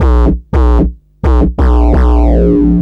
Fuzz Bass, Drum & Bass; Fluid Dynamics

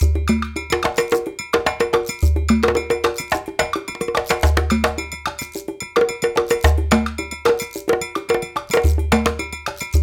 108 BrkDrm Regge; Spectrasonics - Supreme Beats - World Dance

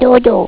sleep; ST-XX Amiga Soundtracker Sample Packs

